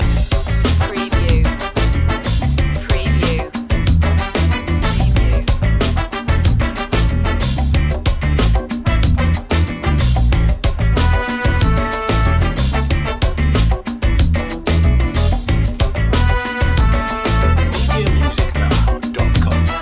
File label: muito bunito loop